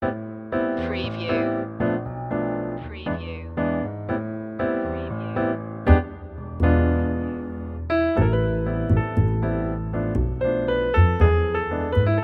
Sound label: bossa